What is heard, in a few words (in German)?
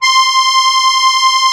MUSETTE C3, akkordeon; Best Service; Alpine Volksmusik